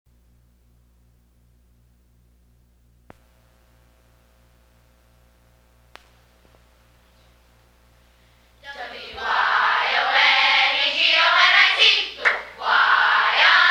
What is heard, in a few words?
Boom; Wyonegonic